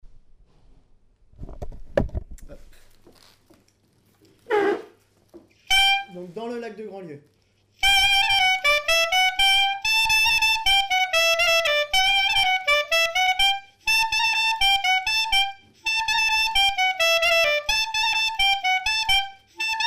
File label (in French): clarinette; maraichine 2 grand lieu